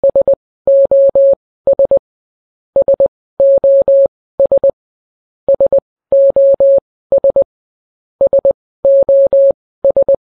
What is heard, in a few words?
sos sound effect